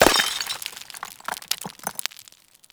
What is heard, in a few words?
Destroy Planter Hanging; Warframe; Ambience; NineteenNinetyNine